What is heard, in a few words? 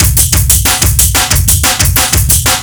Loops, breaks